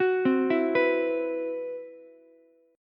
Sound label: C Major 7th Flat 5th, Ukulele, chord; CM7b5 arpeggiated; JGuitar